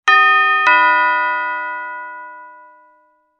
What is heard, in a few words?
Ding dong sound effect